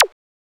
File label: Perc Laser Soft; SSO Percs